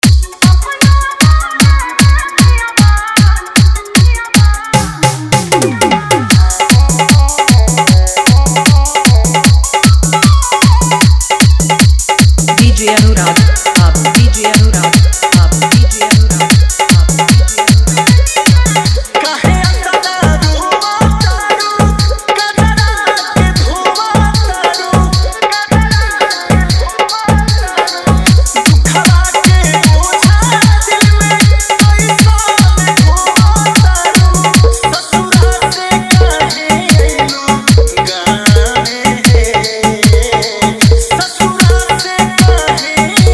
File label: Bhojpuri Fully Hard Bass Mix